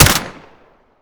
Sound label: mp5k shot; tacz; daffas arsenal